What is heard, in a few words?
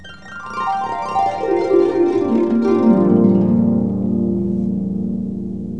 HARP GLISS